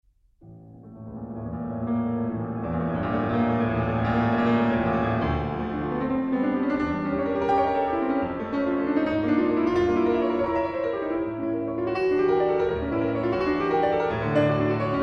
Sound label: allegro quasi lento